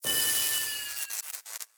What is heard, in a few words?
dream gate fail; Hollow Knight